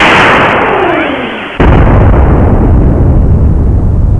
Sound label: digital storm, electronic sounds; Sound Effects for Multimedia - Volume 1